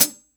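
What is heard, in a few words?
hi-hat brig, EMU Orbit-3 drum machine; 300 Drum Machines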